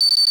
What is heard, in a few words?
laser scan target